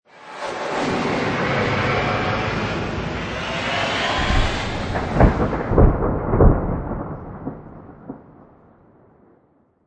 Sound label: Magical Conjure Storm, Magic sound of casting spell, conjuring or wizardry; Witchcraft ritual summoning elemental or otherworldly; LynneMusic